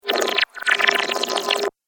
Water - Backward Bubbles

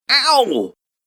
Male Voice Ow; Recorded Voice Sound Effects

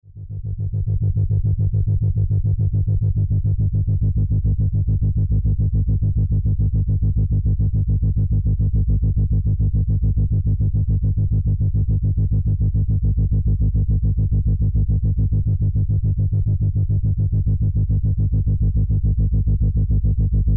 Drone Dark Chopper Smooth Low Hum; Blastwave

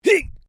MALE VOCALIZATION EEGH; Male vocal sounds; Exclamations; Voice

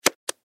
Button Switch

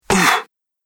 Body Blow Punch Ooh; Punch And Hit Sound Effects; Human Body Sound Effect

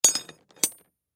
Pipe Set Down Slow Soft Rocks Tool Wrench; Objects; Foley; Blastwave

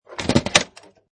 Pinball Sound Mechanical Movements; Video Games; Sound Effects:Games

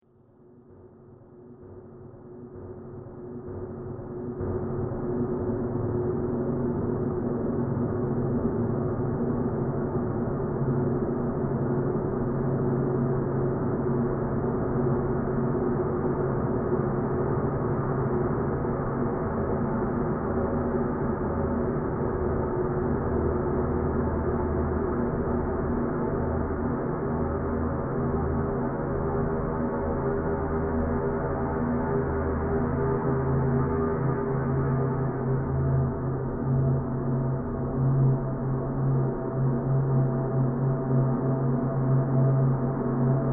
Drone Suspense Ambience; Drone Sound Effects; sound design

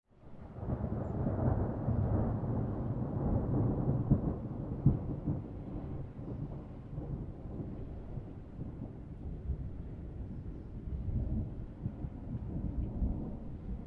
Thunder Distant Thunderclap Long Rumble Tail; Weather; Distant thunder roll; Thunder Sound Effects

